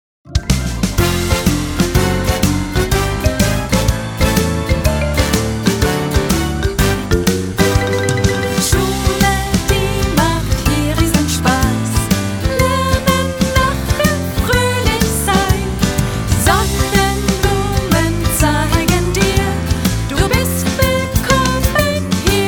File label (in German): Schulhymne; Sportfest